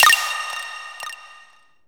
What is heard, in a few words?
SE GAME EXTAP; maimai